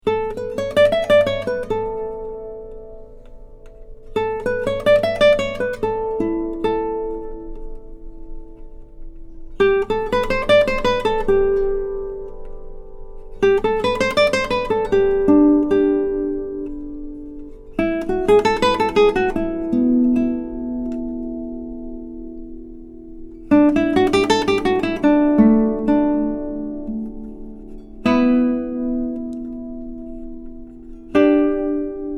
Resonance

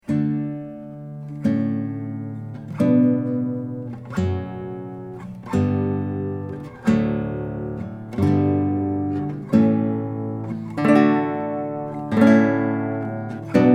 Canon; Schoeps CMMT; RAMIREZ CLASSICAL HARP GUITAR

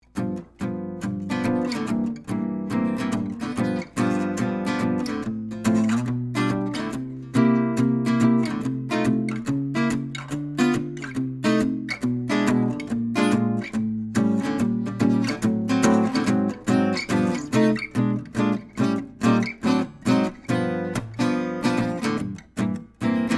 Jazz, Gibson L50; Jazz Blues; 12-Bar Blues in G

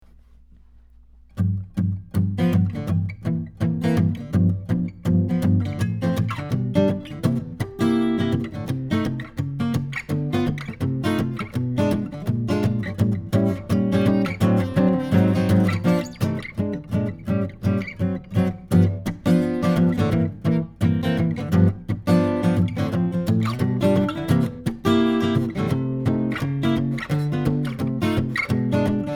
Jazz; AMI UM17R

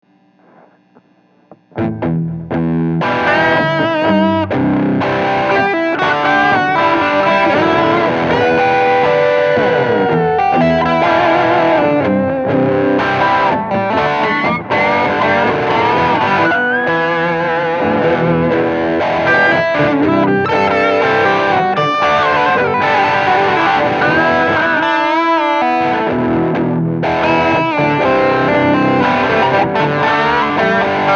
Duet

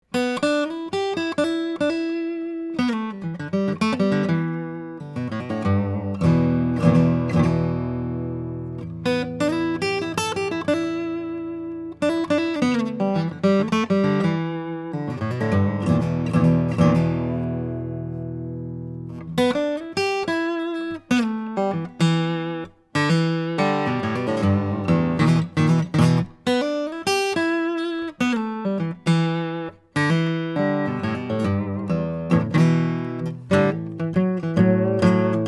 Boogie

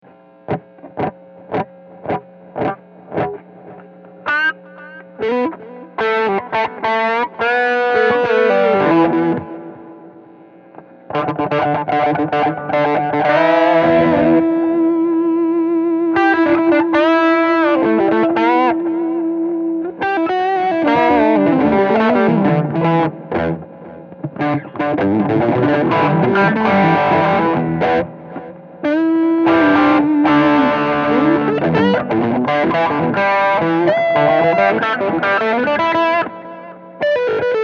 Duet; Epi Casino

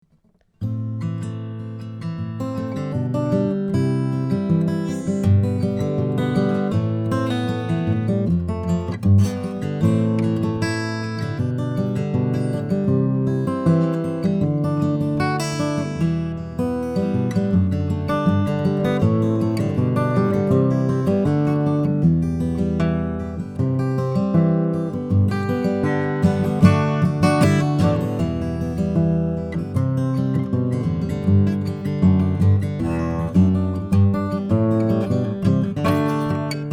Fingerpicking; AMI F44

